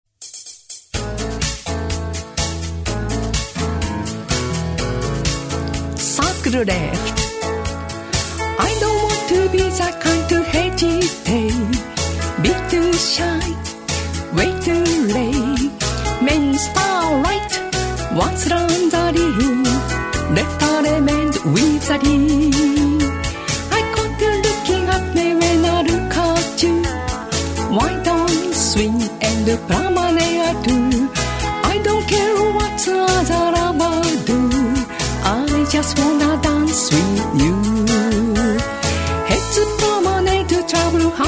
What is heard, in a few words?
vocal